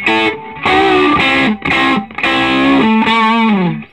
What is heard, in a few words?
Vintage Blues Guitar, SOLO; USB Soundscan vol.22; AKAI